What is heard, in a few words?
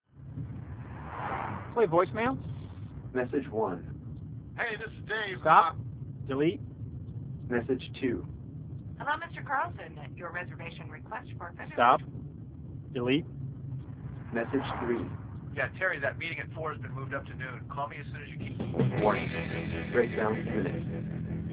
breakdown